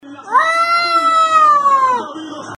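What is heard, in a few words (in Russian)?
вой кота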